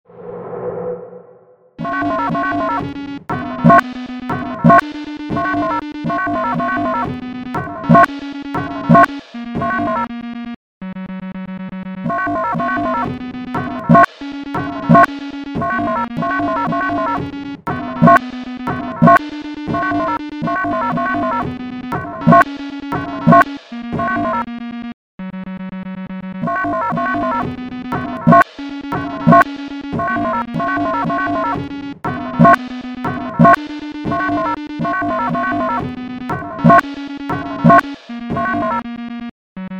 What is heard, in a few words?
Sinewave